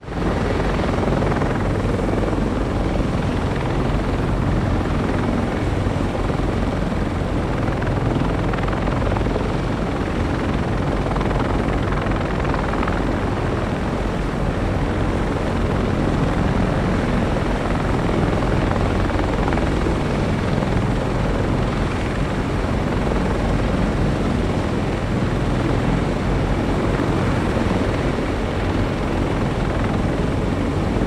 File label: CH-53 Super Stallion helicopter idles on tarmac. Choppers, Aircraft Engine, Helicopter